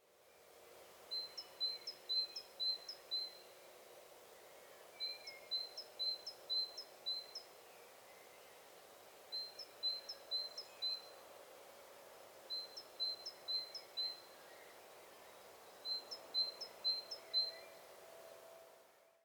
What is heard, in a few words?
Buyuk bastankara Parus major